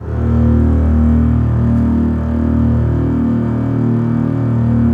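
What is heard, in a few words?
basses sustain d#1; Sonatina Symphonic Orchestra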